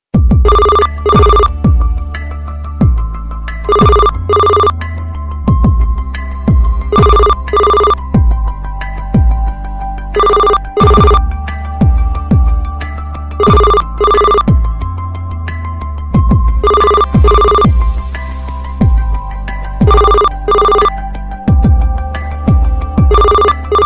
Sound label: blackberry call